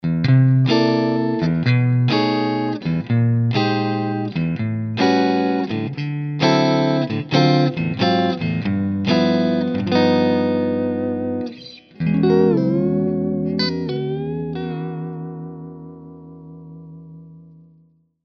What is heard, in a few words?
Strat